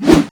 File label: swing blunt